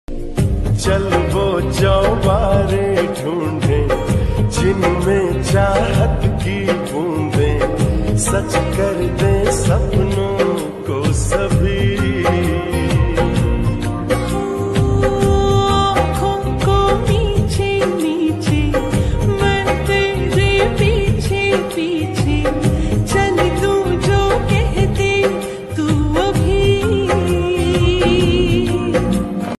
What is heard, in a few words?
lofi flip ringtone